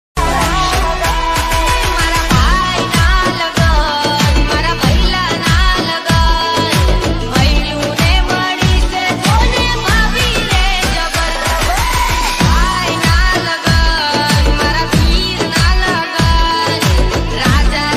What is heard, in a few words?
gujarati ringtone